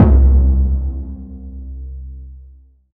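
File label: bass drum, left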